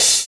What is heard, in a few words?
Crash Short